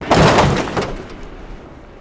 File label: Vehicle Suspension, hits & rattles